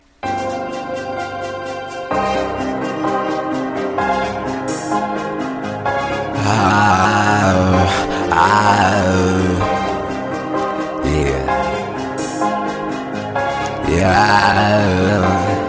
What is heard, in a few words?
Classical